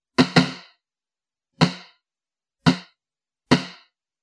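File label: electronic snare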